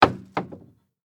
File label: cabinet door close bounce